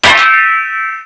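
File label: Rivet Hit Exterior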